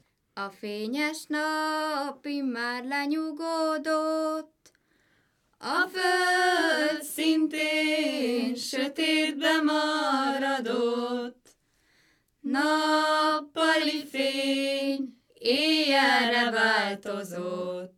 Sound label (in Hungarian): nepdal a fenyes nap immar lenyugodott